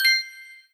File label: Coin